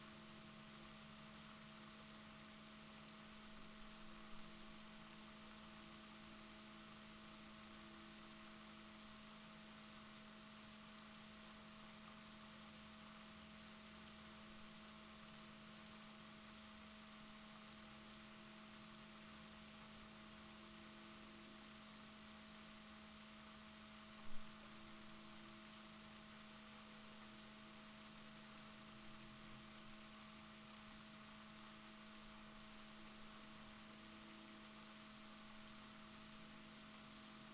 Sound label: rorquals negatif, Balaenoptera Physalus Chile